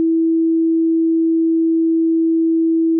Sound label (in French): sinus 327